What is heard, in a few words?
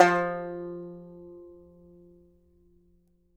banjo F3, banjo notes, country; Sampleheads - Whole Lotta Country 1 & 2